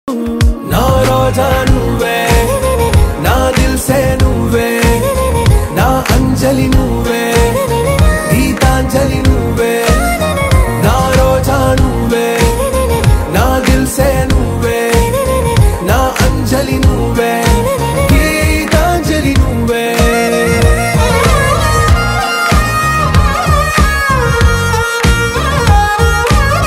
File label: south bgm ringtone, tamil bgm